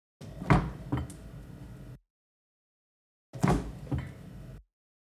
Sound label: Open And Close Fridge Door